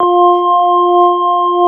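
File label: CX3 organ F4 L, Keyboards Old School; USB Soundscan vol.09 - Keyboards Old School; AKAI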